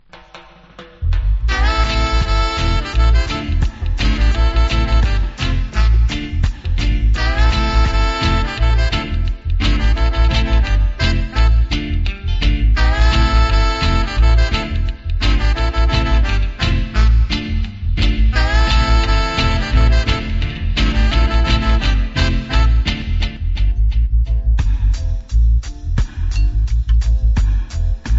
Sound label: Roots Dub